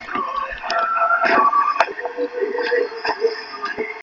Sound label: opera voice